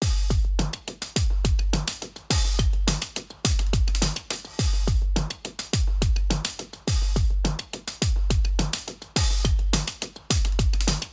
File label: 80s drum comp; gerilim; By Burak Sound Bank; tension vol 53